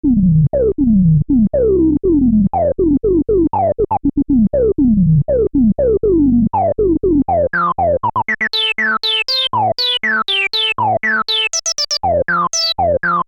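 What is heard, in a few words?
novation nova desktop filter resonant low pass filter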